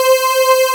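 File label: PLS strings C4; AKAI S6000 CD-ROM - Volume 1; SAMPLE WAVE MIXING 1; ANA SYN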